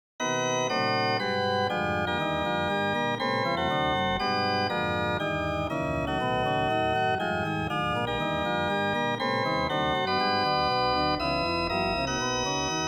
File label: 3rds pyth mean 5ths